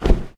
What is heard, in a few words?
bird flap